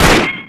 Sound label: Btl Gun